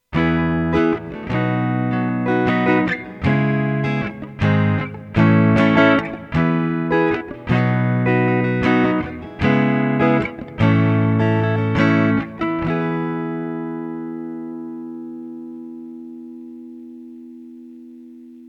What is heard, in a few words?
guitar; gl legacy tribute; s500; with c3